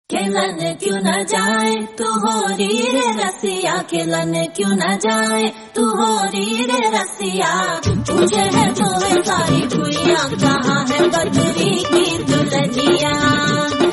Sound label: title song